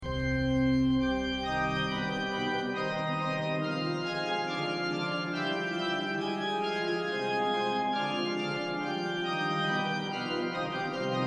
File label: Fugue, organ; Bach; bwv582; cabrera